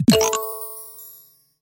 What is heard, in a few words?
gem add